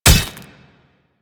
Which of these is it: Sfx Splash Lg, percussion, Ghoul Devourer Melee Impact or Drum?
Ghoul Devourer Melee Impact